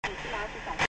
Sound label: Airstrike Activate